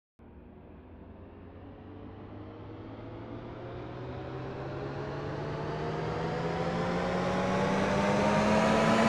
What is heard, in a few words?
ac on, Train; OpenBVE